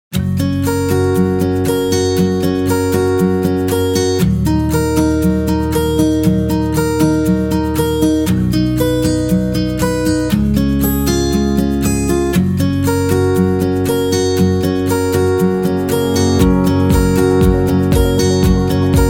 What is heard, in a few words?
Love Piano Guitar; happy music vol 8